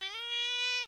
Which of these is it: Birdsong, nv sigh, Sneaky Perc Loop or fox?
fox